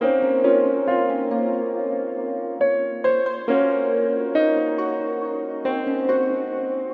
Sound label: piano, Sad